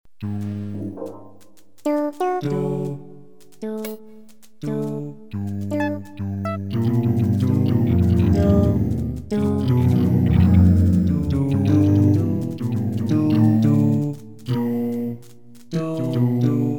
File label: psychograin reverb example set